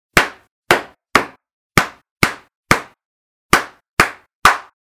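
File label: slipper sound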